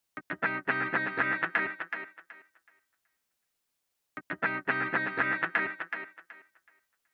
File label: DELAY 18 DOTTED